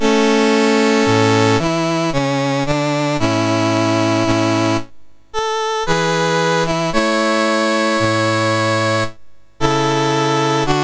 Estonia, National Anthems, MOS6581R4

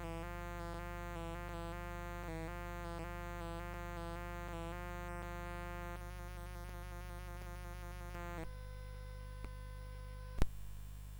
Balalaika, CSG8580R5

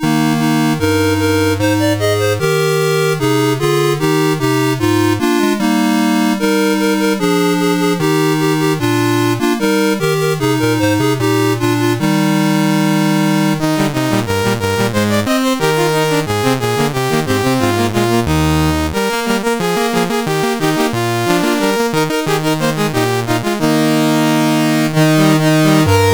Baa Baa Black Sheep, CSG8580R5; Marte Brengle

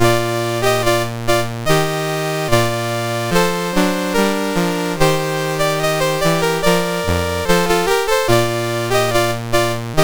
St Anthony Chorale, MOS6581R2

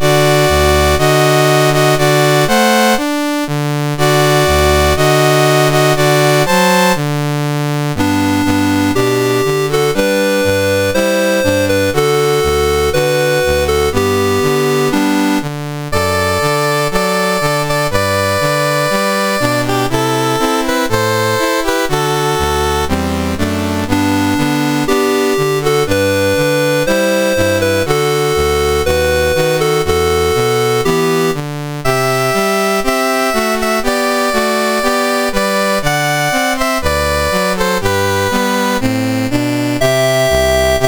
MOS6581R4, National Anthems; Monaco

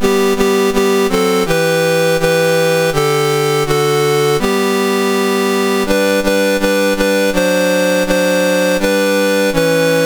Zambia, National Anthems, MOS 6581; MOS6581R4

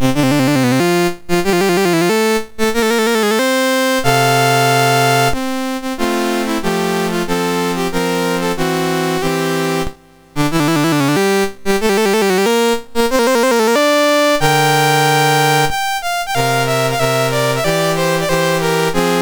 Brazil, National Anthems, MOS6581R2

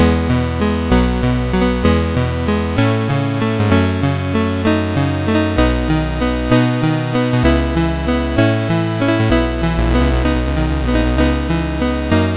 Prelude in E Major, CSG8580R5; Op28 No9

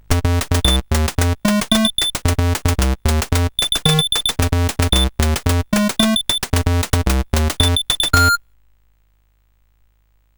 sid, CSG8580R5; Coco-Notes

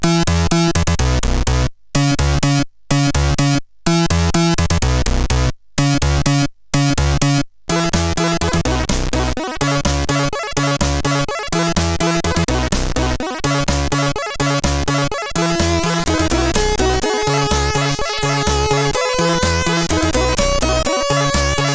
Soul Ballad, CSG8580R5

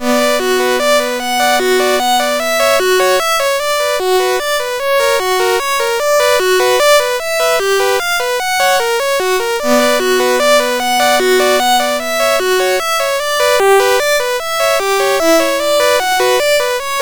Prelude, MOS6581; Syl

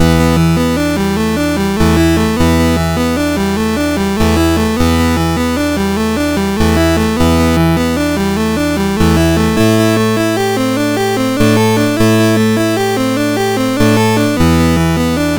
Sin Gigue, MOS6581R4

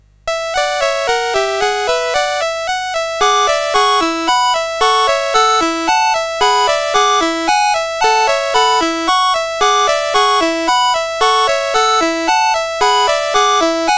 musical snuff box, mus CSG8580R5

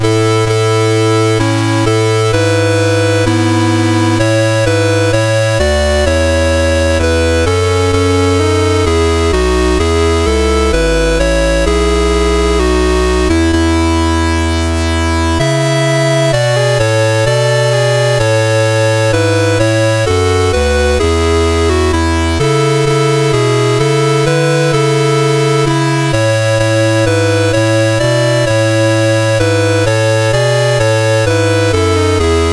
O Come all ye Faithful, MOS6581R2

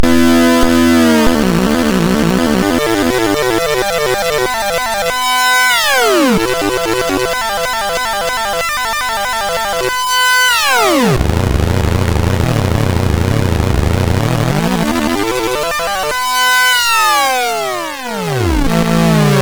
sid MOS6581R2

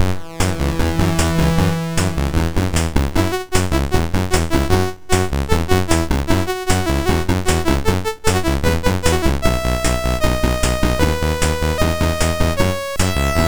sid MOS6581R2; California Games

